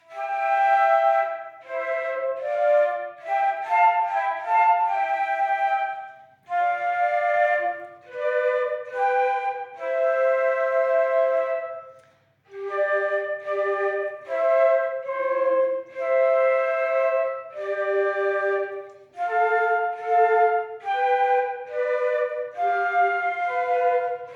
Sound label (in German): Kling Gloeckchen kling Querfloetengruppe